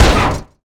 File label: freezer Hit; HeavenStudio; lumbearjack